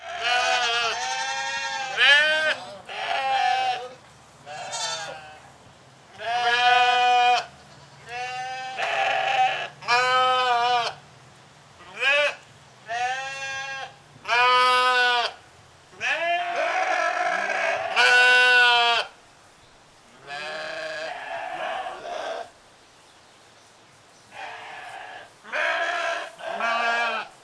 mouton

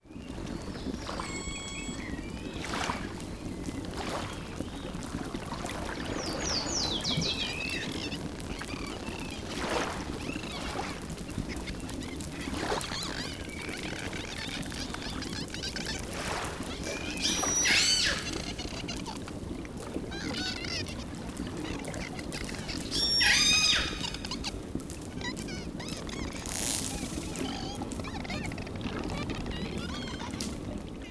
dauphins

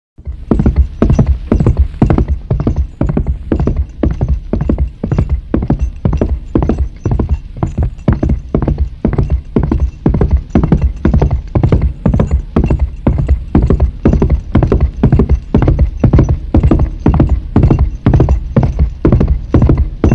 cheval galot

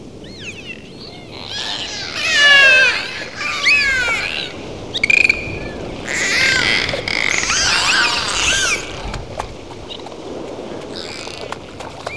dauphin

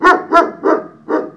chien, animaux nature

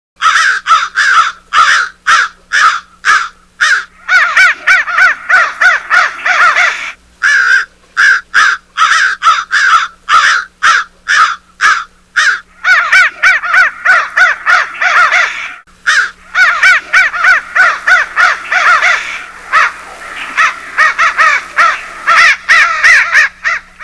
corneille

